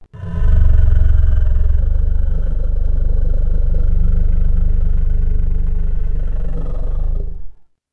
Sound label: Long Growl, Dino